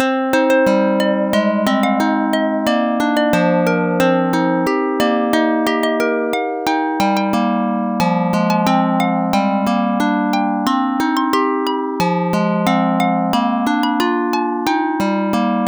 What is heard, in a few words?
sitar